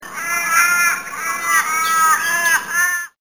ravens

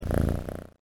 cat

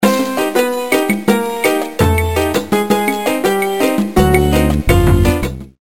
salsa dance

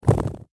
bat flapping

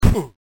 bullet body

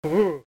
SHIVER

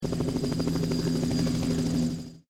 copter

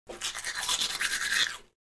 brushing teeth

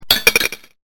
COIN

